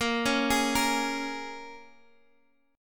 A sharp Minor 7th, A sharp, down; A#m7; arpeggiated

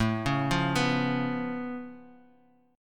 C sharp Augmented 7th, A

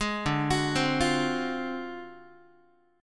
D 7th Sharp 9th Flat 5th D down Guitar; D7#9b5 arpeggiated; JGuitar

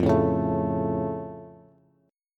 F Augmented F Bass; strummed

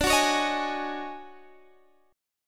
D Major 7th Flat 5th Flat 9th D, chord

